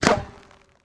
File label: Slingshot, Single Release Into Dirt; gun and weapon sounds; captain audio; fully loaded